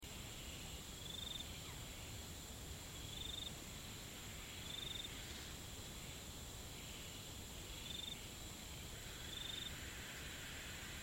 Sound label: barn owl